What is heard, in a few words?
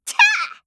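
attack, Voice Line, Korean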